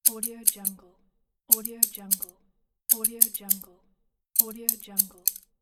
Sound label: the scissors cutting